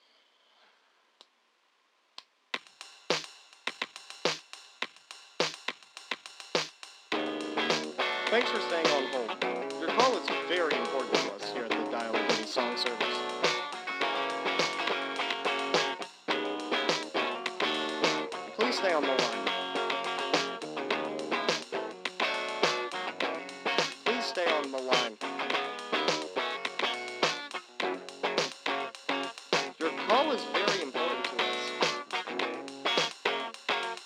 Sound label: hold music; Dial-A-Ditty